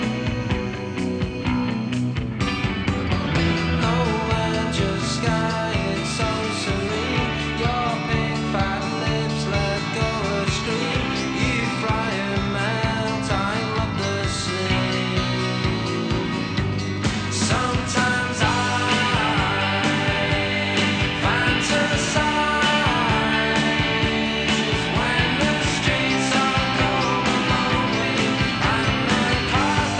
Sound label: rock